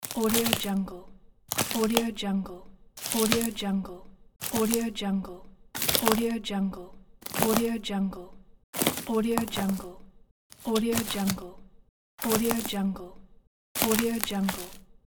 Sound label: footsteps walking through branches